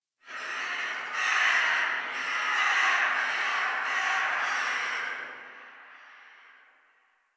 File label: Tree Distant Critters Scared, Ambience; Warframe; Duviri